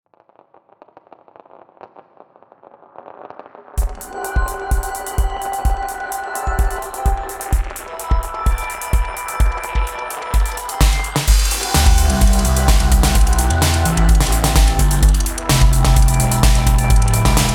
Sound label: chromaphone 3; blindspot; niall mccallum